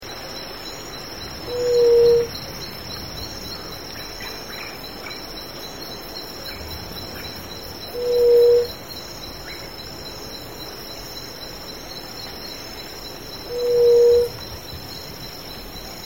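Yerutí Colorada, Leptotila rufaxilla, Gray-fronted Dove; cantos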